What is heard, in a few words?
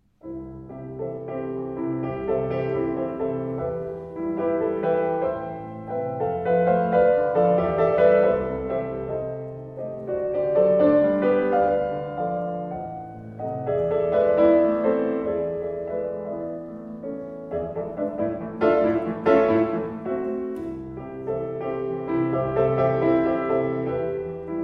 Piano Pieces, Intermezzo, Grazioso; 4 Piano Pieces, Op. 119; Brahms